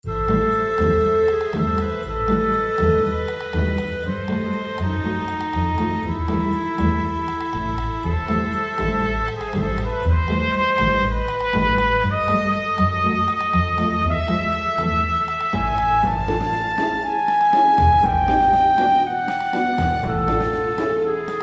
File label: theme defeat